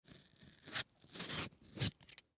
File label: Potato Peel Skin Slow; Food; Foley; Blastwave